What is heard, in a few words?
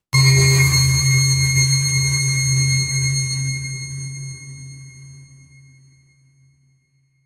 premium logo sound, corporate